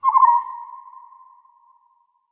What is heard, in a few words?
waterfowl; ambient soundscapes; dota